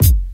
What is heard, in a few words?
2000s raw rap kick drum single hit d sharp key